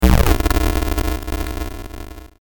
disappear; sfxr

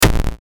hit; sfxr

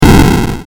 explosion, sfxr

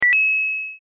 coin, pickup; コインを拾う; sfxr

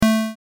pickup, sfxr; コインを拾う

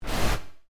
transition fx; criminal case game

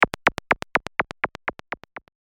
small footsteps, sfxr; sin

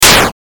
explosion, sfxr

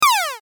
laser; sfxr

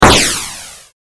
attack, explosion; sfxr

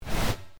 transition fx; criminal case game

